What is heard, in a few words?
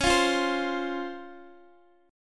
Db Major 7th, Guitar, Open D